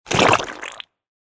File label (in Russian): vyplevyvaet chto to neprijatnoe